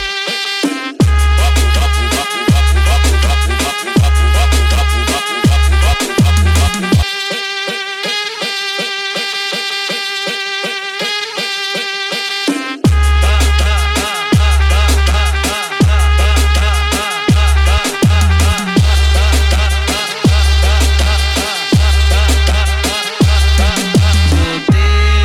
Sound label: funk bass